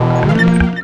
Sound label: Boss Hit